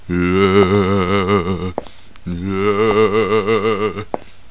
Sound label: zombie nearby; 1997 MMX LAN PACK (win 95); Army Men